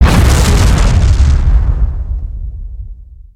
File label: ship explosion; destructibles